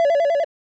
dialing; Mistergenerator

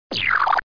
zip Down

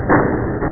open door; spiele spiele spiele topware

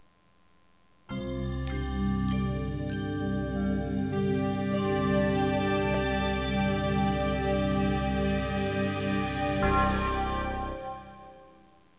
jingle

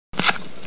slip gun

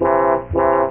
Toot; BabySmash 5.4

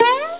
emu jump; austrlia

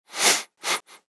make perfume; Immortal

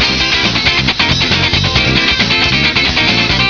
outro; Factory

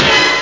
Horn Blst

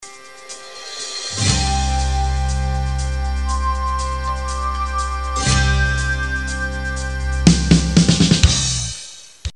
pop; army; music

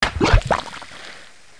in lava; Heretic II; Player

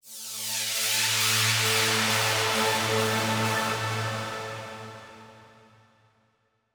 SYNTHPAD, 125; Sphere

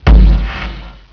Flame; WARLOCKSNDS

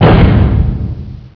impact; steel

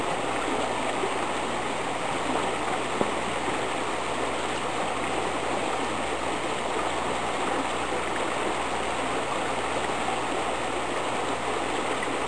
Stream

